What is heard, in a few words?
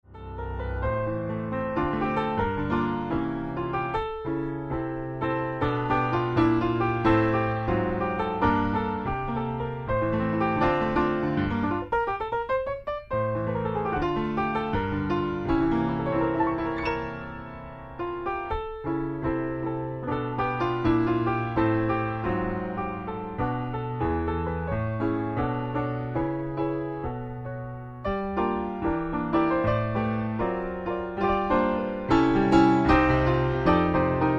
cocktail piano